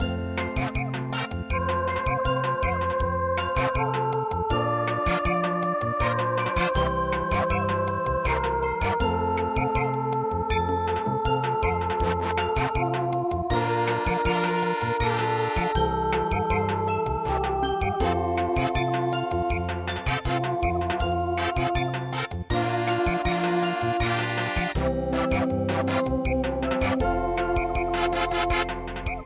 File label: British Samba; traditional